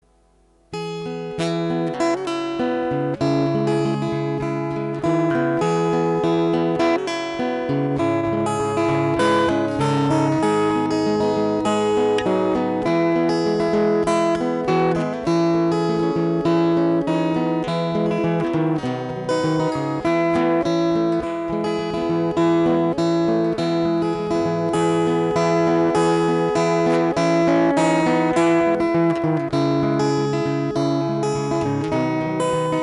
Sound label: Acoustic Twang Thang